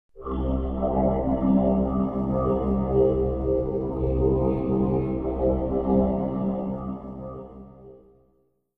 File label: stinger; Myst III Exile